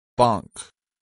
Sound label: bonk